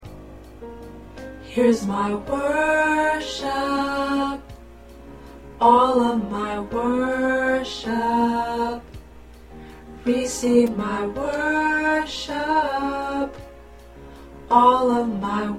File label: Tenor, choir parts